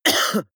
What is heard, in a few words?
cough male single; koerpergeraeusche